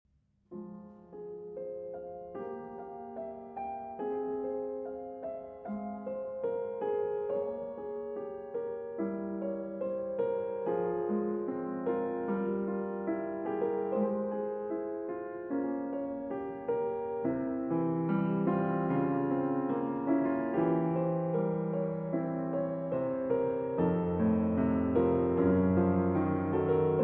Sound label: Prelude & Fugue in F Minor, BWV 857 I. Prelude